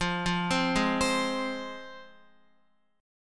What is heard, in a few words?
F Minor, down, Guitar; arpeggiated; JGuitar